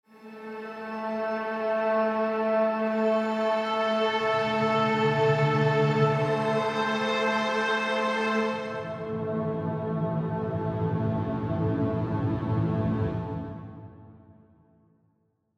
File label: Soundscapes Full Range